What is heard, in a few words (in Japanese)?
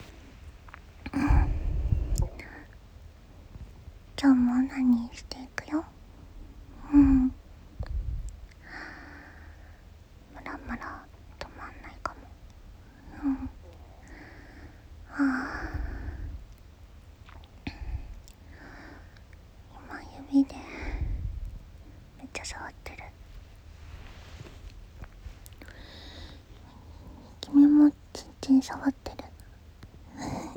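現役JD おなにー, 演技なしオナニー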